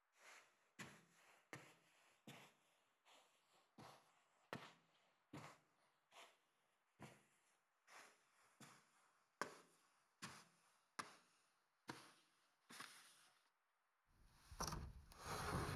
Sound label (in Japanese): 耳かき（左耳）, ASMR; メリーの耳かき（左耳）